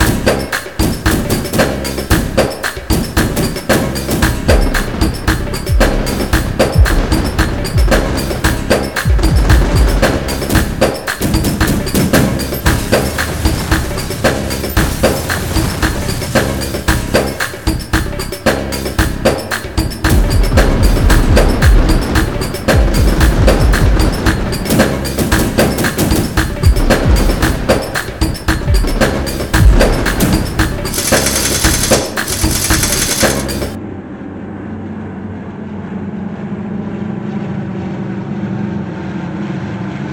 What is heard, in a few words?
Balkan Intro Outro